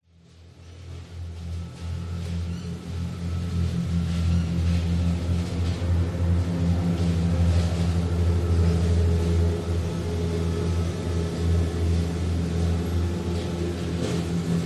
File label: grass mower buggy commercial cutting grass distant then close